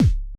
kick, Roland MC-909

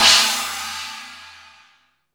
HAMMERED, CYMBALS; CYMBALS 2

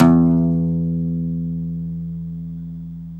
OUD E 2

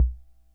Ace Tone Rhythm Fever Kick, Drum Machines

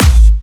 Clubby Kicks, Bassdrums

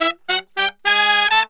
100 AM L, BRASS SALSA

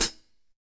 14, Drums; Big Fish Audio; Ross Garfield Drums VOL-2

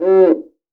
F-CUICA LO; AKAI S-Series CD-ROM Sound Library VOL-5

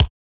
mod kick, Mod Drums; Synths and FX; neuro; Sinistarr

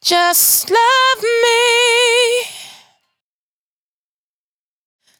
92bpm Bb, Velvet Vocal; Zero-G

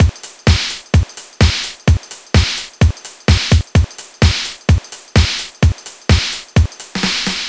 Drums, 128bpm, Nu Dance Electro; Zero-G; Soundclash; Combi Loops; Dusseldorfer

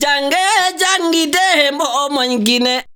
VOCAL LINES; Best Service; World Colours

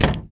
DOOR, INDUSTRIAL

